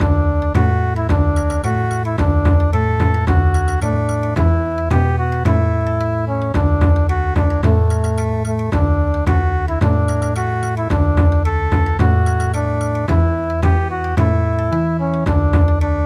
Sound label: Bon Odori; traditional; Village dance gangar